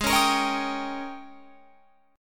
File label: Ab Major 7th Suspended 2nd Suspended 4th Ab, down, Guitar, Open F; AbM7sus2sus4 strummed